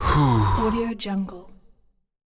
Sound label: man tired